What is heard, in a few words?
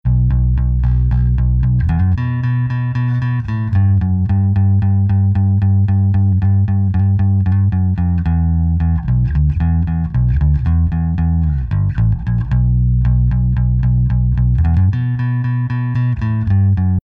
bass with effect